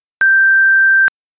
Sinusoid no fades

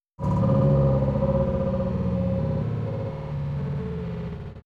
Test sound rev

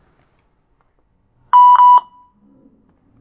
double beep 987 Hz

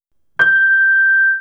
Piano G6 plus sinusoid

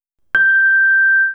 Piano G6 onset trimmed piano dampened

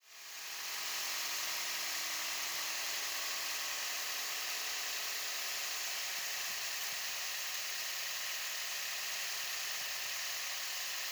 Echo steady state HPF 1500 12 db

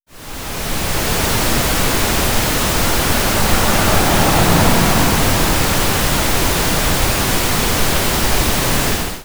Car passing unattenuated

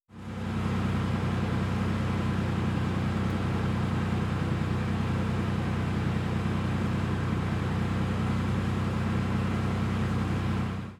Truck idle 100 Hz cutoff

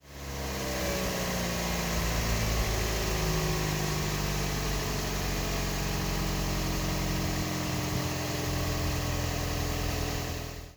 idling

